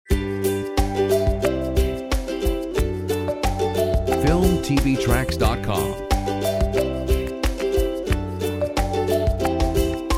Joyful Ukulele

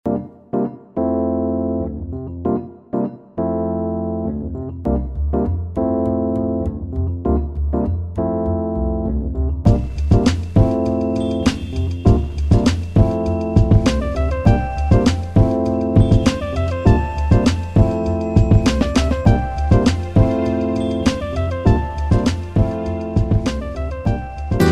Ambiance Lounge

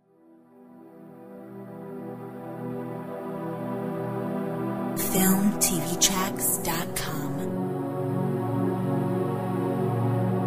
War drums

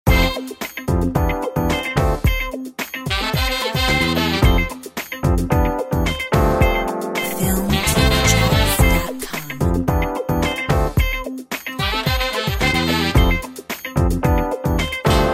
Soul; Filmtv-tracks

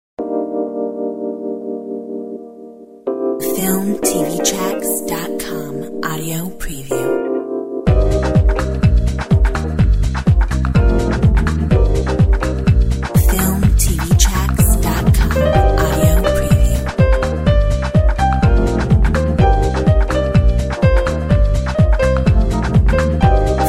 Lounge

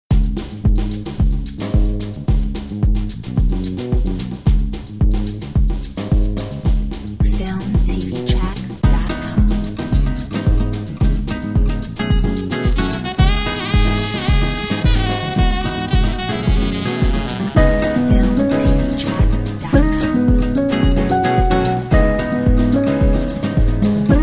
Jazzy Bossa

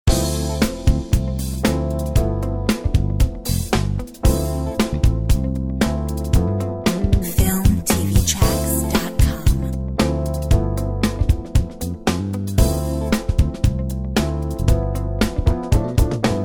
Soul Jazz vibe; FILMTV-TRACKS

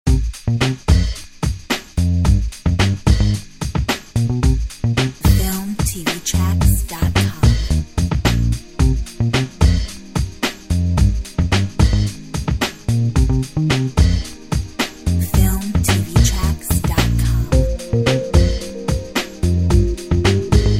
Funky

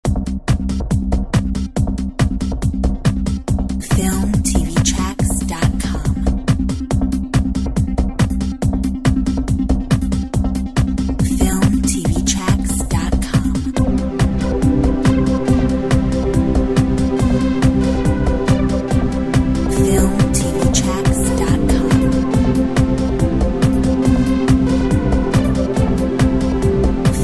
Techno

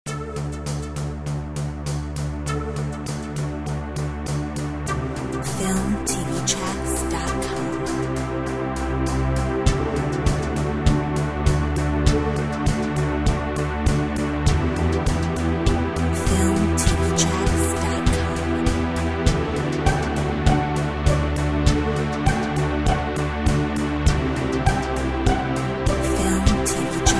Ambient Pizzi

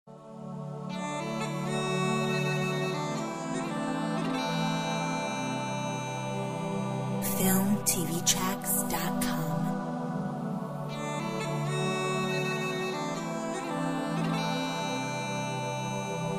Strings of compassion

